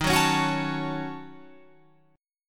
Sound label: D sharp Minor 7th Flat 5th, Guitar, Open F; D#m7b5; strummed; 15,13,13,13,16,16; chord shape